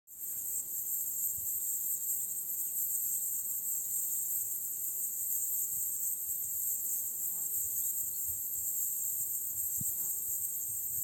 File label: mountain sounds summer, nature sounds; rossenkarpuzov